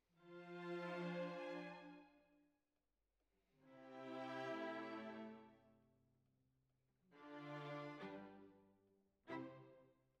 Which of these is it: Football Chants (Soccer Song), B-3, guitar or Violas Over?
Violas Over